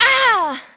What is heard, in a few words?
death; Pulse Racer